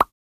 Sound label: Water Drop Notification Midday; Xiaomi Redmi-8A